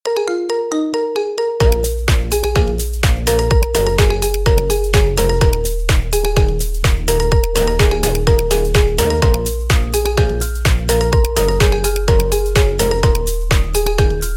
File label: marimba remix